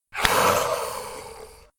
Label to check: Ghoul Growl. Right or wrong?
Right